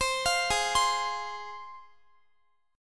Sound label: Ab 6th Ab, Guitar; x,x,22,22,20,19; Ab6 arpeggiated